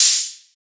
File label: Ride, Techno Drum One-Shots; Ghosthack - Free Techno Drum Shots 2024